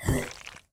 hit, rotter; monsters

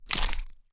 blub hurt

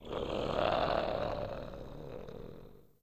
zombie move, monsters

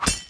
sword small

